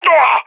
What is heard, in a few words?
death; Counter Strike; CS:GO; leet epic